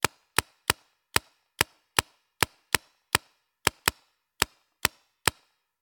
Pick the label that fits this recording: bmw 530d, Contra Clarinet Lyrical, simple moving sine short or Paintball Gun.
Paintball Gun